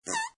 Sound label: Cartoon Fart With Squeak, Very narrow air passage; Comedy/Cartoon; Cartoon Sound Design; Lynne Music